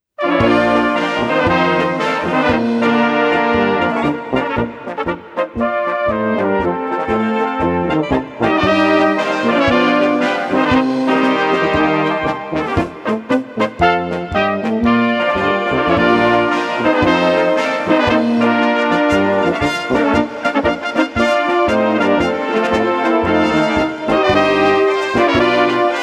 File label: Polka